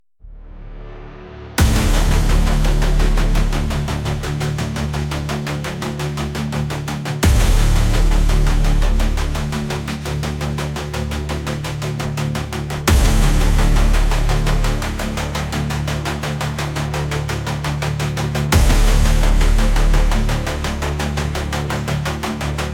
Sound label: bass boom bass